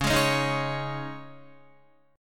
Db Suspended 2nd Guitar 4E,4A,5D,5G,5C,6F; strummed; Dbsus2; JGuitar

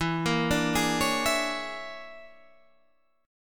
Bb 7th Sharp 9th Flat 5th E

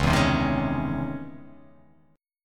Db Minor Major 9th Db; strummed; DbmM9; JGuitar

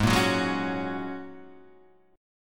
G sharp 7th Suspended 2nd Suspended 4th; G#7sus2sus4; 4,1,4,1,2,2; strummed

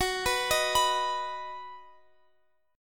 B Major Gb

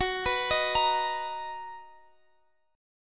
B Major 7th, guitar chord

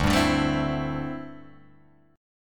D 7th Suspended 2nd Suspended 4th, Guitar Open G

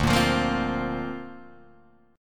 D-Minor 7th Sharp 5th D Guitar DADGAD, chord

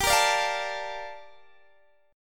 G Minor 9th Guitar DADGAD

